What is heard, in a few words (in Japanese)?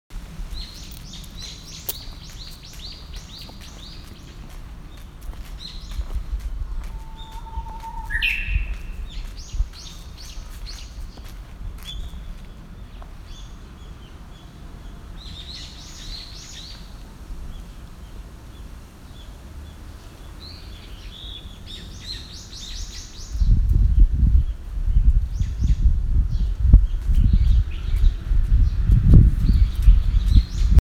uguisu